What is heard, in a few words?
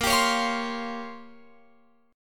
A sharp Minor 6th Add 9th A sharp down Guitar Open F, chord; A#m6add9; strummed